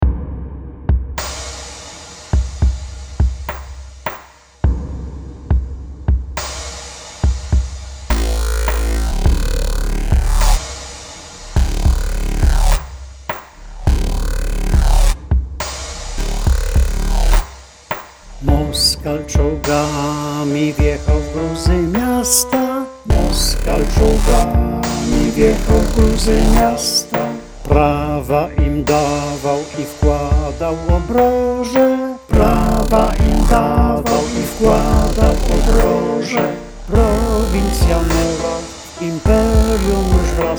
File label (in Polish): Chor; Moskal